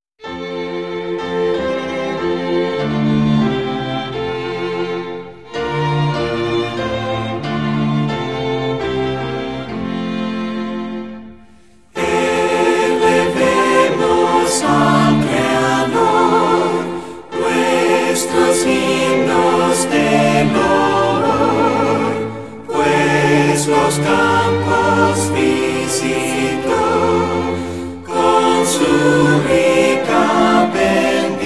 vocal and instrumental, hymns spanish

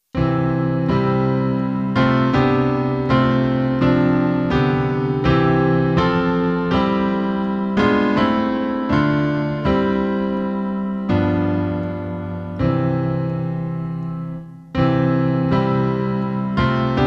instrumental; hymns spanish

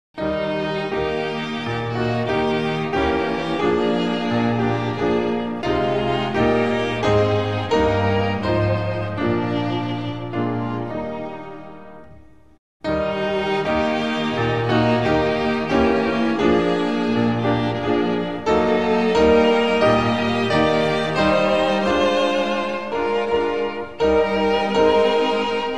instrumental; hymns spanish

